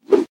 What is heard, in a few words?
sword woosh; NCH Library